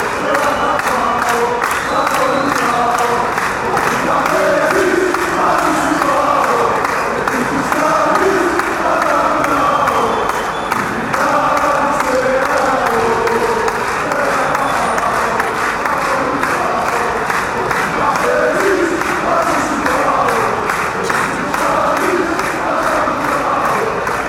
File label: panathinaikos chant